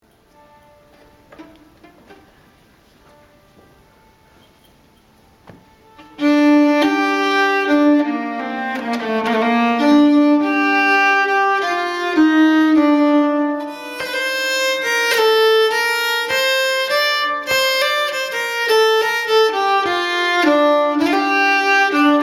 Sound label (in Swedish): Falu Polska Lent, nyckelharpa